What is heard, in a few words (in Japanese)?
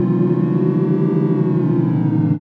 gayagaya